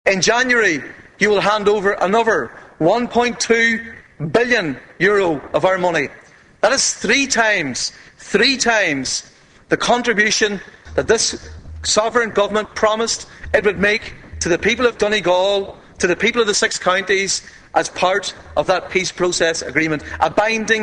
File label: pad